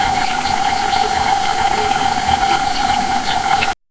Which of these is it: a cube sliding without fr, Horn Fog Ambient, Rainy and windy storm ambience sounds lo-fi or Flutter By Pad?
a cube sliding without fr